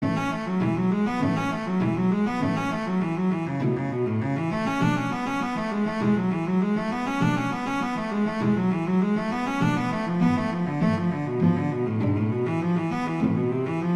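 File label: Etude, cello; Popper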